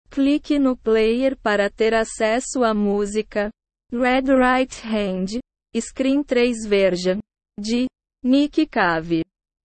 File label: scream